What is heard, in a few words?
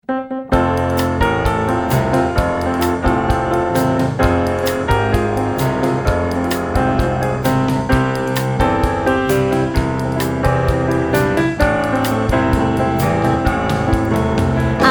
Noisy Tongue Dancing Sound